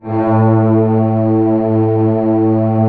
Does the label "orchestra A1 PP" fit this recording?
Yes